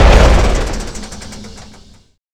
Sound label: Vehicle Engines; Red Orchestra Ostfront 41-45